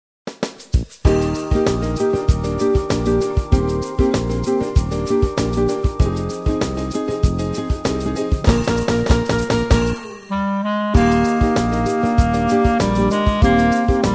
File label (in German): klarinettentaxi